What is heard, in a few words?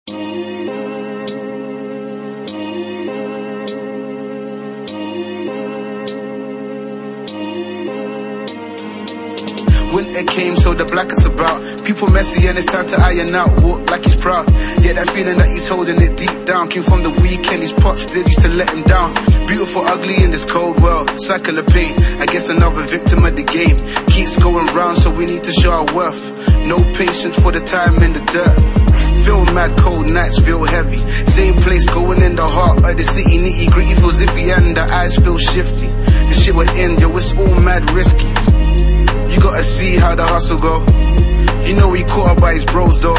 Lofi Hip Hop Vocals And Vinyl Scratch Loops